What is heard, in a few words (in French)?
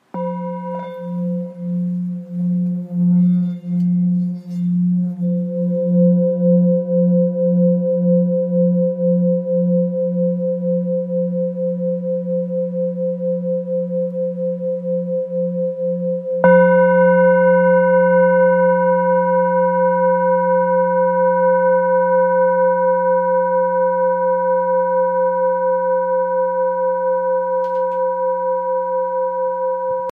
Bol bengale Fa